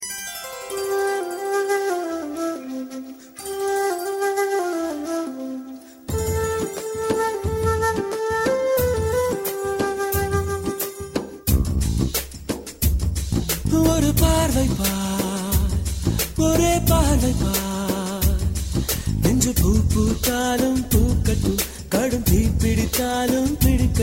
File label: tamil ring tone; country